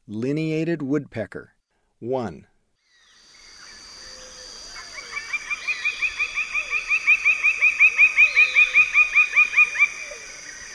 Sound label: Lineated Woodpecker; S. America; Eastern Lowlands